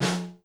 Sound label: Snare; Hylics